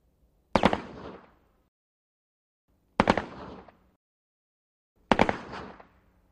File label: AK47 Double Taps Dist